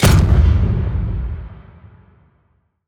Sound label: Coilgun, Weapons